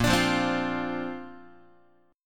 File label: Bb Major Add 9th, Bb, down, Guitar; strummed; JGuitar